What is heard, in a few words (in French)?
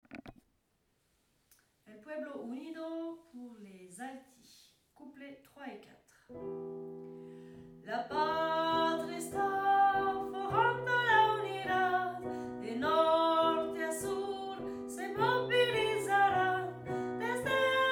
Couplets Alti; pupitre alti; enregistrements de travail